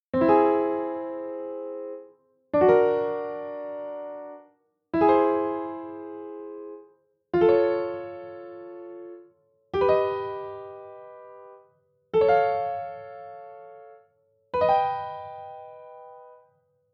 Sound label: chord name augument sound